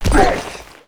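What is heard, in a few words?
ghost damage, monster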